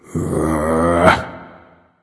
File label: grumble